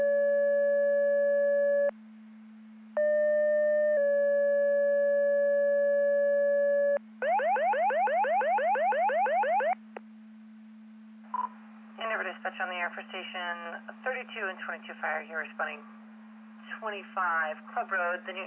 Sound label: Siren; Andover Twp Boro